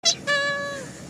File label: Cockatoo; Peekaboo